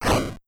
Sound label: Swoosh